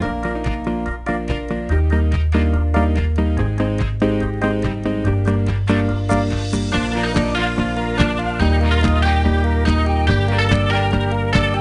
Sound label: Groovy Smoothie; iCarly: Groovy Foodie; NDS gamerip